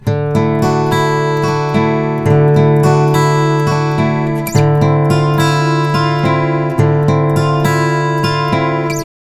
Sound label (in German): Gitarren separat eingespielt; Live Klang in Studioaufnahmen